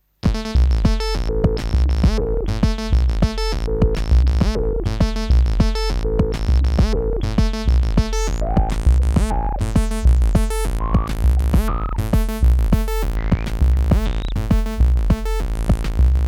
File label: Volca Bass test